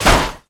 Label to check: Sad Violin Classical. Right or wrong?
Wrong